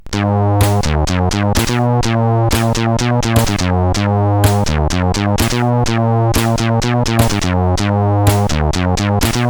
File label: Sounds, CSG 8580; CSG8580R5